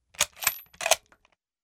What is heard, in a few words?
enfield fast, British Enfield No.4 Mk 1, WW2 era rifles; bolt action fast speed; authentic WW2 era rifles; record authentic WW2 era rifles and capture the true sound